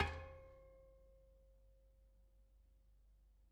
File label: Bicycle Spoke